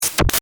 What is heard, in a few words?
Radio Transmission, Scifi, Radio, Transmission, Feed, Cut, Off, Science, Fiction; Communication Sound Effects; BlastWave